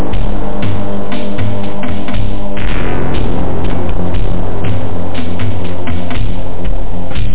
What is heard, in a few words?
open; Jason Aller Floppy Collection